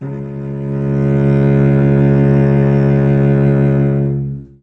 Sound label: cello bowed; Orchestral